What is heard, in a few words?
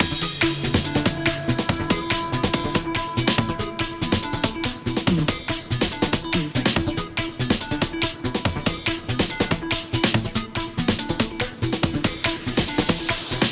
In Game Loop; Move or Die; ps4